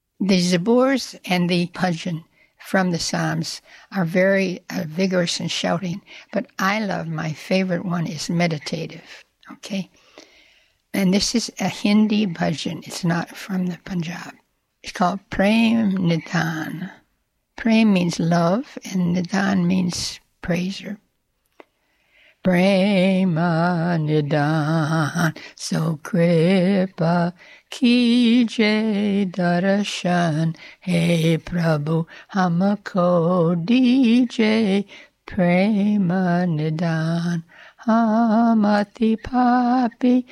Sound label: hymn